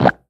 Pullout Grenade

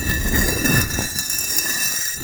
FRICTION Brick Slide LOOP; gamerip; the looker

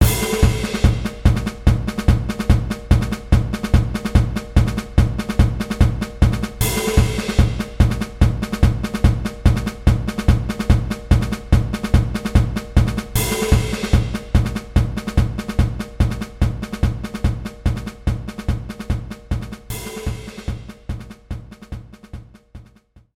grass battle normal; world grass

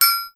Rhythm Whoops; super crazy rhythm castle

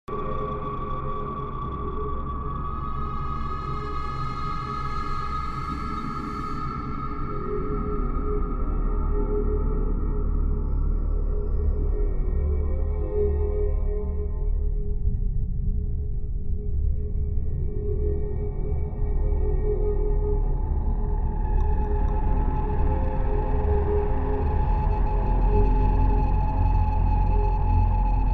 New Ambience; Secret Neighbor; ps4

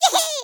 rat victory; ice age scrats nutty adventure

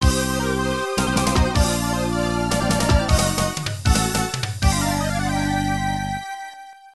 Stage Starting; Mega Man The Power Battle; CP System II; arcade

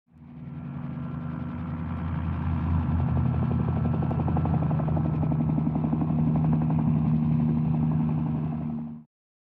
loop helicopter; override mech city brawl ps4 switch windows xbox one gamerip 2018; Cutscene 1; helicoptero

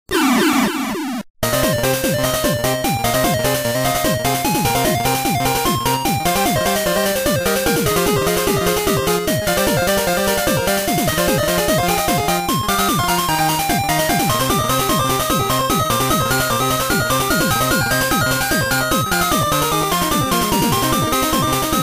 Field Battle; Shi Kong Xing Shou; Space Time Star Beast

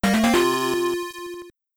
Missile Fanfare; Metroid in the Action; Return of Samus

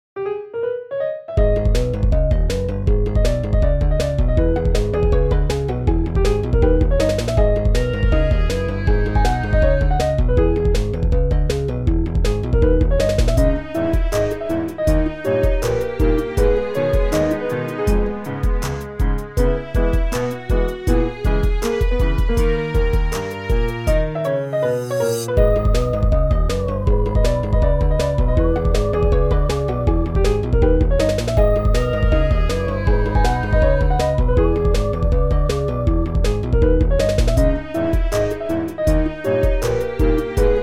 Main Music; Plants vs. Zombies

